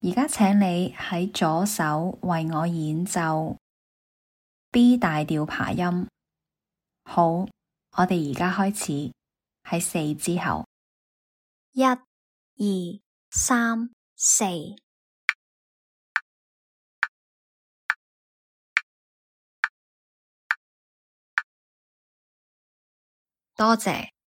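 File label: B major arpeggio left hand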